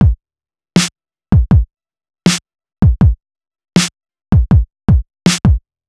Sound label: Hip Hop Beat, Drums